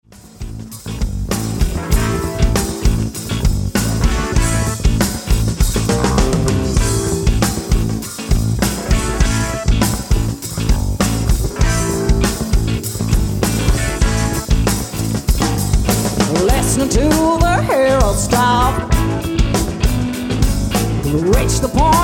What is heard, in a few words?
notes and sound live